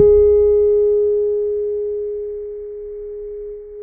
G#4, Piano, cp80

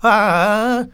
Vox, Vocals Male, World Pop; Sonic Foundry (Sony Creative Software)

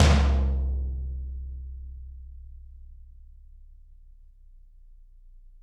Toms, Drums; Bob Clearmountain Drums I

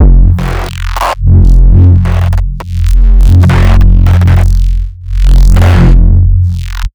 Neurobass

